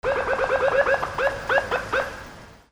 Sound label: ALARMS